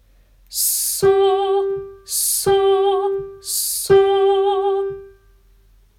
ソの子音Sを練習

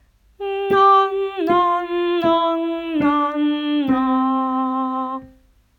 Na ソファミレド

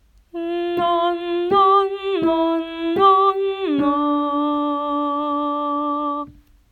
コンコーネNaでファソミソレ

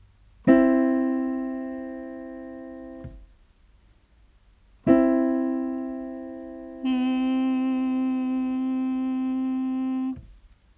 和音 ドミを弾き ドを歌う

